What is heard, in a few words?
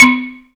ZYLIMBA; Greytsounds VOL-1